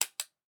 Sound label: button gun foley